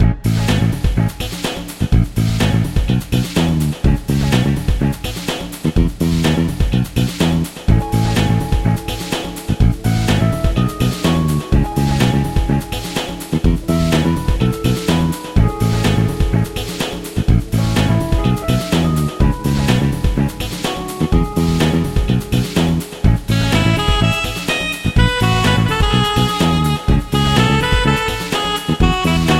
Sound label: castle funk